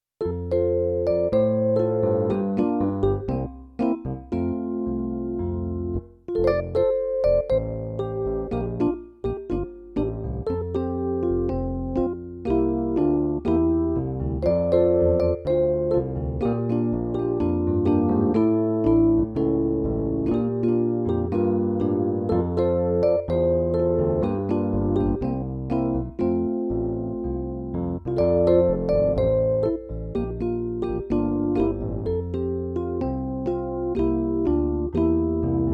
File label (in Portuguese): playback hino